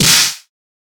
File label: jab